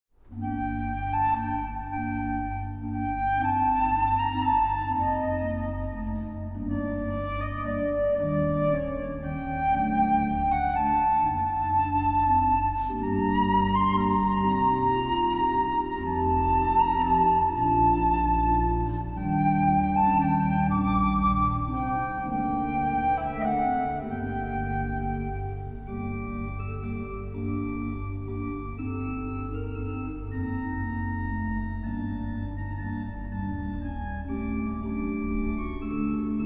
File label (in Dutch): Siciliano (2 orgels en fluit)